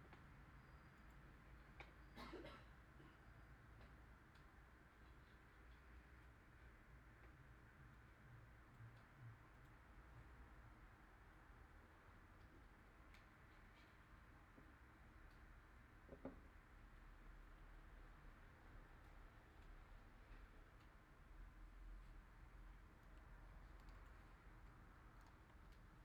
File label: office, scenes; IEEE AASP